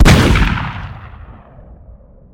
deathblossom fire

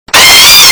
xenomorph death

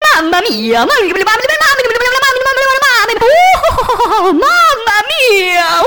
pumpkin scream mama mia; mission folder that appears when you have taken too long in a ghost house

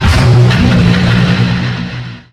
searchlights tank horn

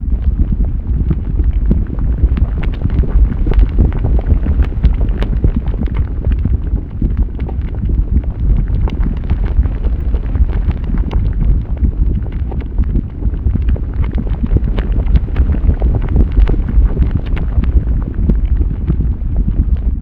magma loop; robotfactory

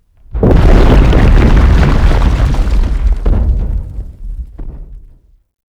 asteroid collision; cinematic

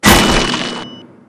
charge collide flesh

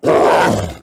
brute hurt; trespasser

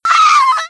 raa

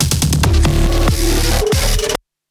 transition

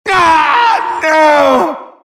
die

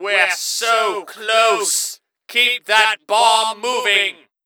Bomb Alert; Gray Mann MvM

